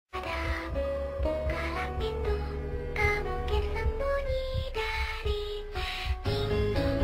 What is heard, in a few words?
creepy lullaby